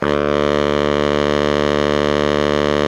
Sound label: BARI MF D1, saxophones; Greytsounds - Philip Wolfe David Crozier's Saxophones; BARITONE